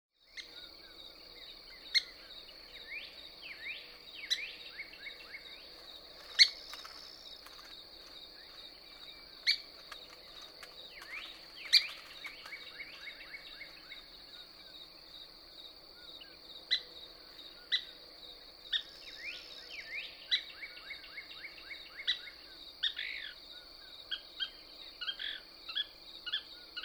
Couch's Kingbird

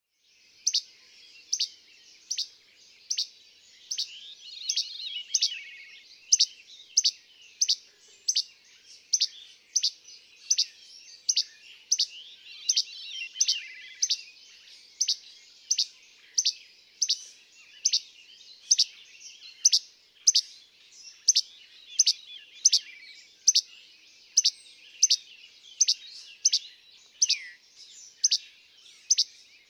Least Flycatcher

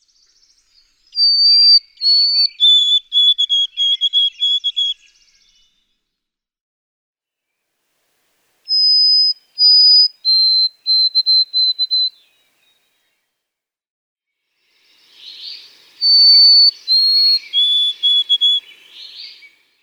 White-throated Sparrow

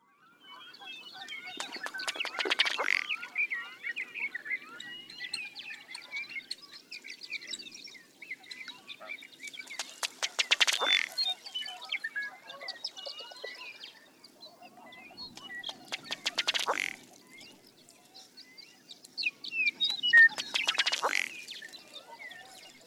Ruddy Duck

